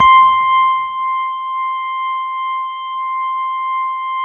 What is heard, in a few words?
C5, CLUB ORGAN, Roland JV 2080